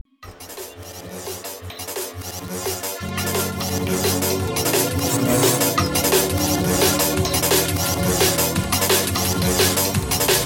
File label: neurofunk